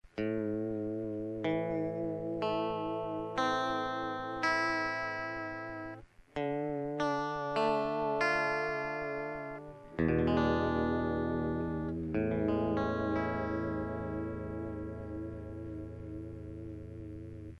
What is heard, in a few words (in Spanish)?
Lento, Phase90